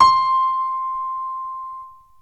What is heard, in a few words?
Piano C7